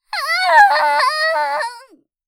"ああああああ！", 母親; ハイグレボイス集

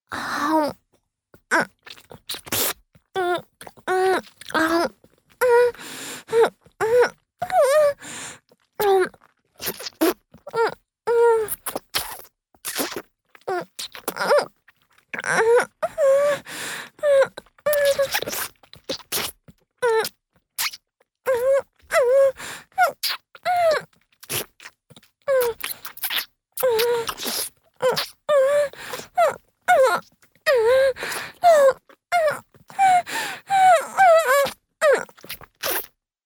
フェラチオ 亀頭をしゃぶる; フェラチオ(中)

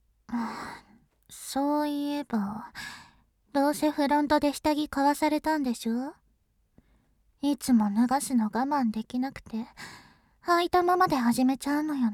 下着ごとフェラ チャイム SE有り

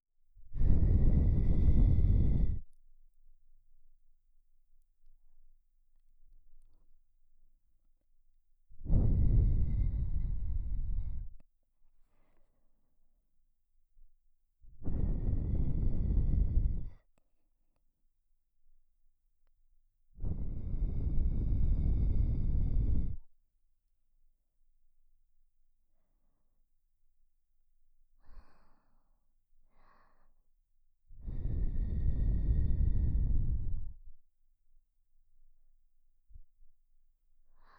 耳に吹きかけ

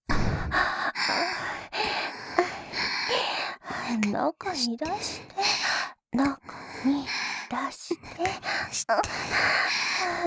中に出して 喘ぎ

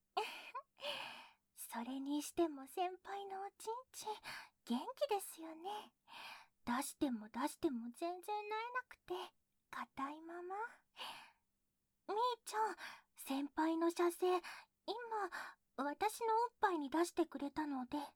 耳舐め, ASMR; 芽衣の甘々正常位と美月の濃厚耳舐め♪

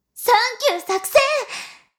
しこしこ音声; 少尉; 牧場戦腺

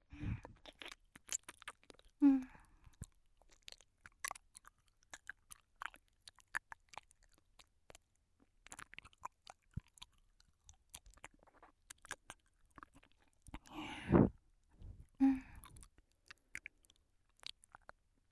右耳舐め; 本編2; 和菜の内緒おみみなめ1

